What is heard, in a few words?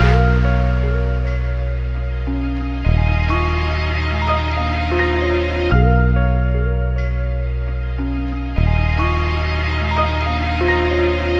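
guitar and keys, phonky loops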